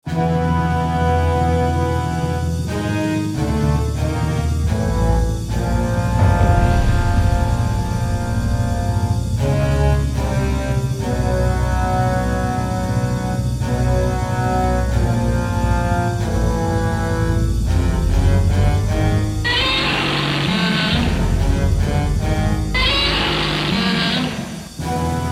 CM-64 SRX; Zilla

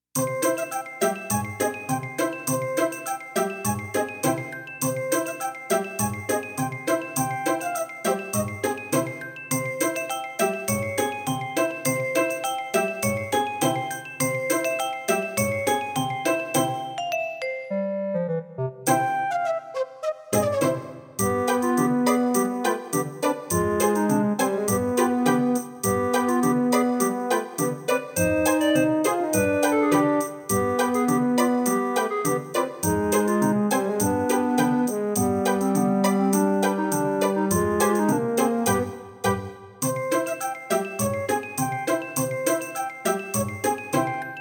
SC-55 MT-32 Soundset; Deathgate

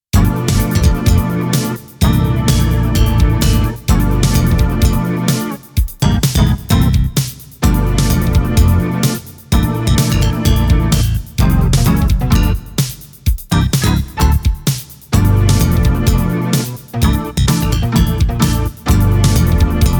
Roland SC-88

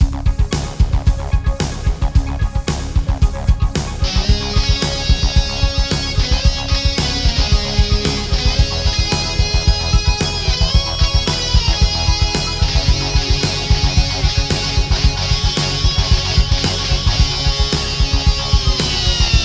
SRX; Space Quest 1 – Rocket Bar SRX Draft

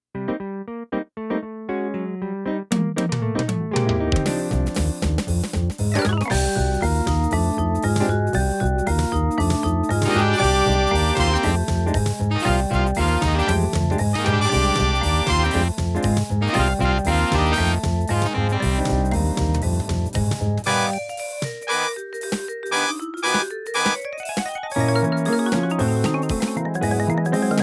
SC-55, No Effects; Sam&Max Theme

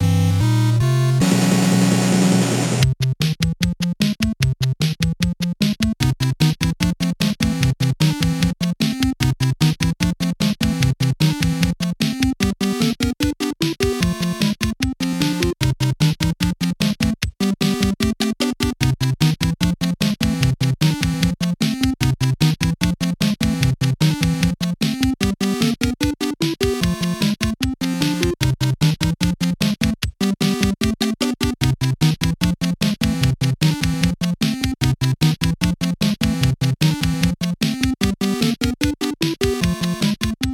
Faux Chiptune; Mario3 Hammer

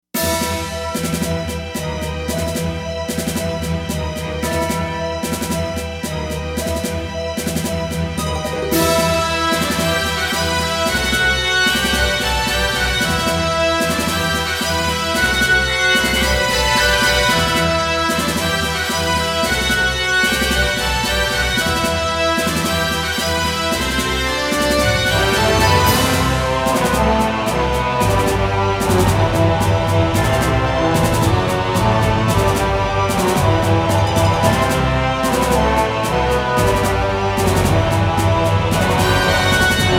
SRX; Killer Instinct 2 – Saberwulf Theme

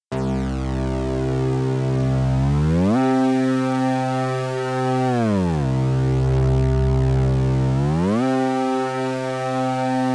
Weird Patch Channel 2 Dry; Channel 1

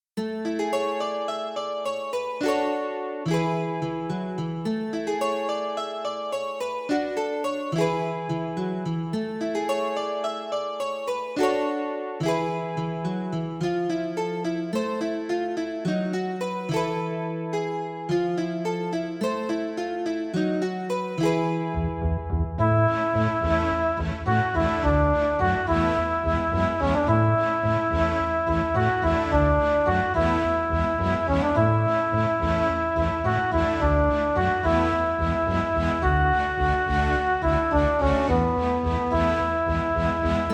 B3 SC 55mkii

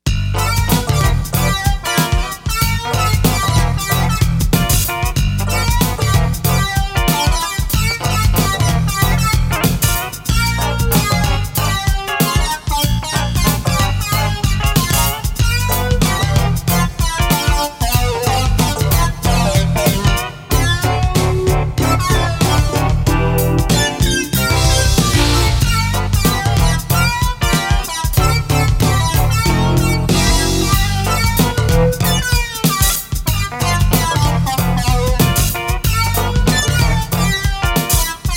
Remix Roland SC-8850

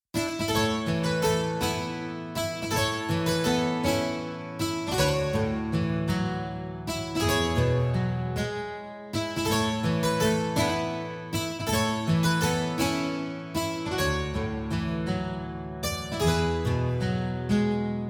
XV-XR Acoustic; DeathGate

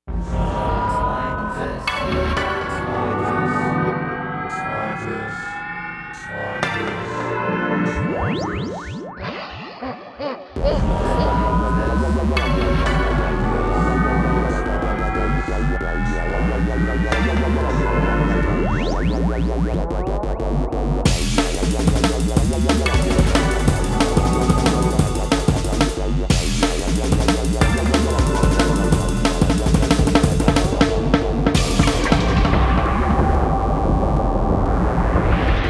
Fantom XR; Roland Fantom XR Synthesizer/Sampler

